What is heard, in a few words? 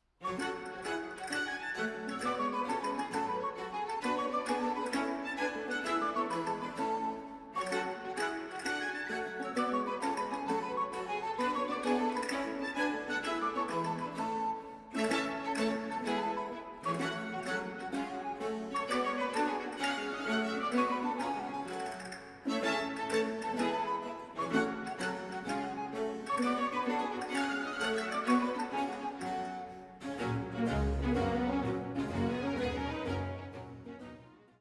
Water Music Country Dance I II; collegium1704